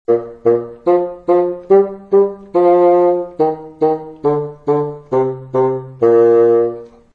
bassoon twinkle

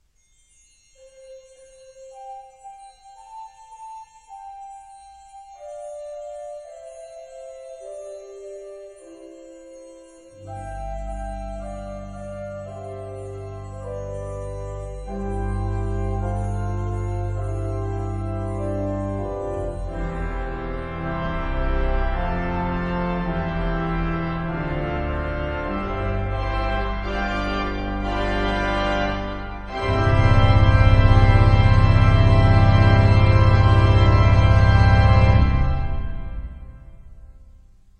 Organ twinkle

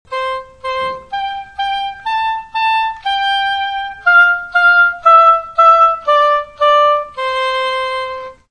oboe twinkle

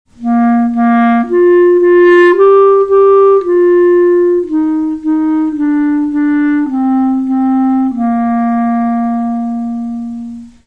clarinet twinkle

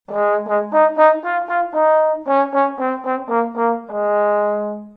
trombone twinkle

